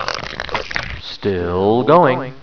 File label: SLOPPY